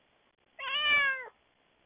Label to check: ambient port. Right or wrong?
Wrong